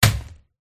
goat attack contact bottom

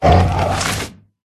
forest keeper attack voice bottom

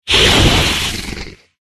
dodge; uborg

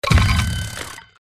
Scolopendra DODGE

